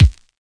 kik

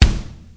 gated bd; Kicks; single hits

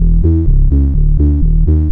bass, bass loops

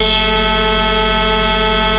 Synthesizer Middle C Notes, Lead

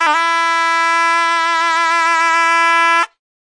reedy instrument melody; WORLD

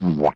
vintage cartoon shplatz; Cartoon Sound Effects Kit

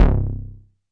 mbrute syn, Arturia MiniBrute; DRUM MACHINES

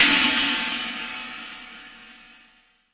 alloy crash long

